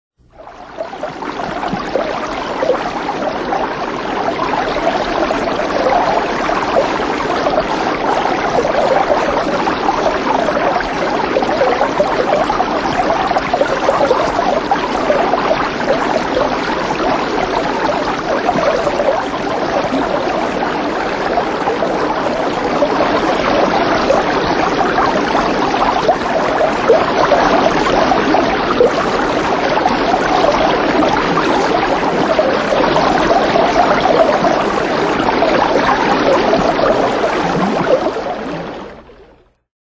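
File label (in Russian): бурный ручей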